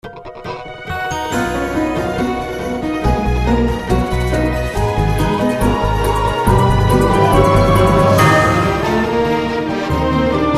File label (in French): Sonnerie Portable; Desperate Housewives